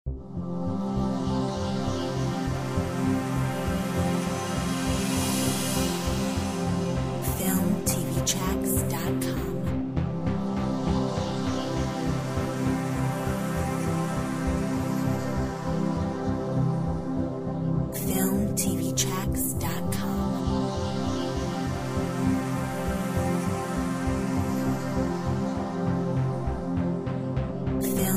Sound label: Ambient fields